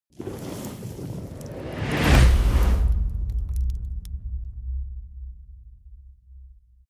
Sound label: whoosh fire torch ignite